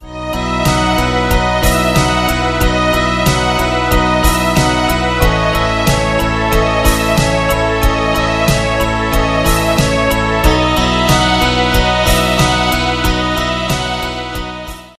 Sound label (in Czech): vyzvaneni; Eurotel